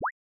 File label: new message; QB64; qbjs; apps